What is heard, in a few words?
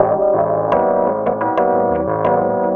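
KEYS loop R, Drum & Bass 175 BPM; Ueberschall; Drum & Bass Flash Trackz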